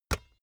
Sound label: basketball single hand catch soft